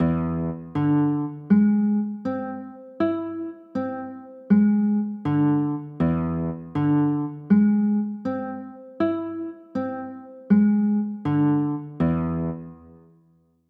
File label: e 7 sharp 9, chords